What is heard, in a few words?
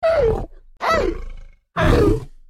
Big Death Collapse Voice